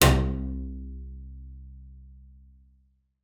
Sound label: C2, bass pizz; Xsample VOL-5